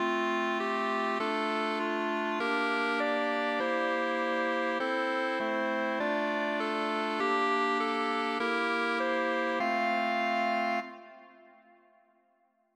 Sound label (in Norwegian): versjon m melodi